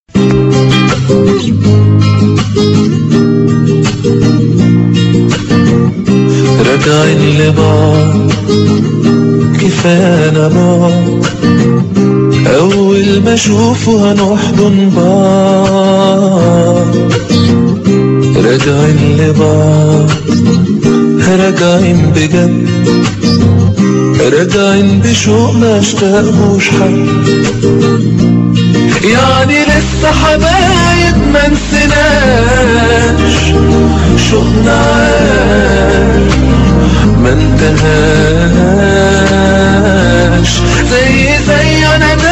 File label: Guitar; egypt